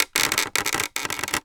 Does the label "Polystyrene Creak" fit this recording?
Yes